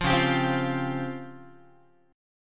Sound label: C sharp Major 7th Flat 5th, C sharp, Guitar; strummed; chord; 8,9,10,10,9,x